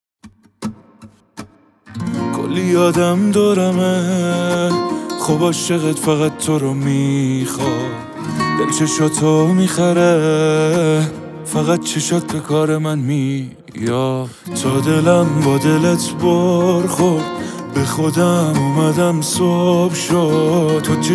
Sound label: Guitar Version